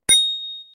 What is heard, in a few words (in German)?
Fahrradklingel